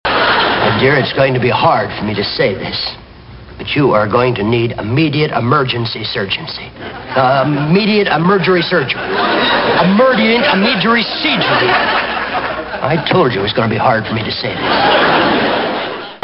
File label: laugh